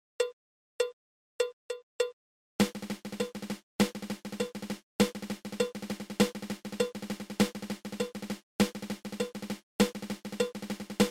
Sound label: Triple Ratamacue